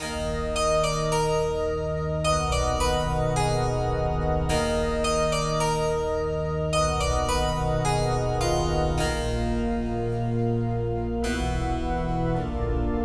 Harpsi music; Hellboy

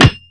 pipe hit cement, melee; KINGPIN; weapons

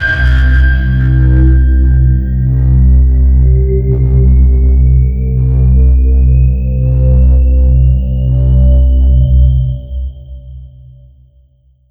Textural Soundscape; intelligent dance

